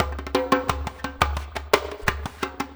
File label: Tactile Impulse, Processed Drumkits; Sonic Foundry; Zero Gravity Beats